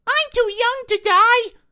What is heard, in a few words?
town human far; Blind Drive